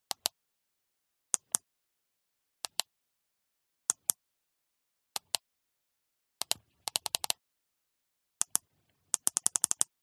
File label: Walkie Talkie Buttons; Cobra MicroTalk; lesser vibes